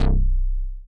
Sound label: POLE BS, MONO TRIGGER